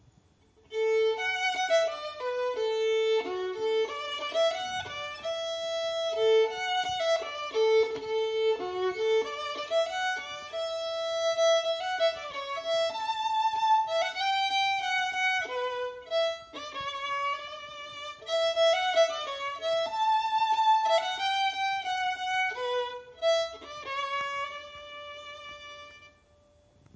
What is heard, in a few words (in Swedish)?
Dansk